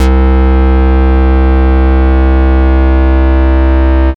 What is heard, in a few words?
Bass Note C; Best Service; House Definition VOL-1; Basses